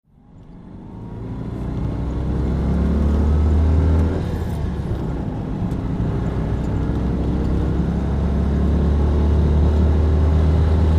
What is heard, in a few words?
Citroen Deux Chevaux, Interior Pov, Driving Medium Speed with Gear Shifts.; Citroen Deux Chevaux 2CV